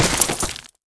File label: Cloth